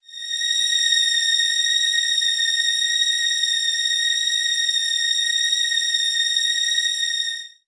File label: FX A#; Vogue; FX Collection; Hip Hop